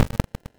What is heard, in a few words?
Blight Arm Activate; Weapons; Player